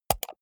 computer mouse wheel click fast hard

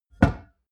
glass door small close damped

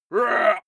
male shout hit soft

